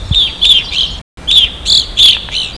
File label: Evening Grosbeak